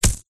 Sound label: horror yeti monster footstep single heavy; zapsplat